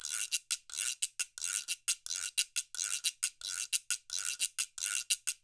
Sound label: Percussion works loops; Sampleheads; NYC Percussionworks; ST LOOPS 88